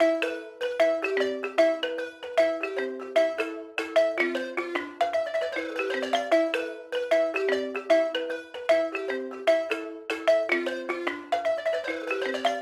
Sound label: balafone loop melodic percussion E slaps; The Gambia sessions